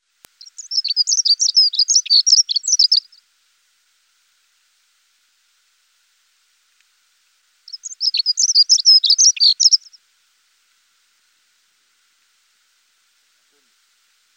acentor comun

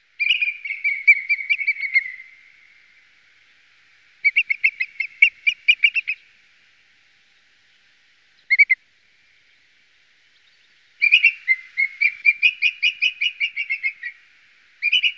aguila calzada

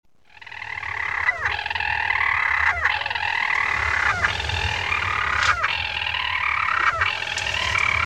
paino europeo

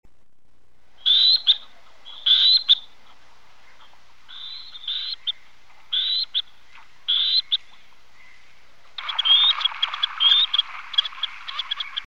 zampullin cuellinegro

